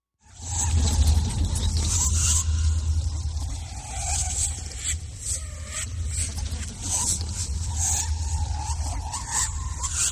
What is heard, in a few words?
Complicated Voices Multi High Voices Sweeping Wash Fearful Echoes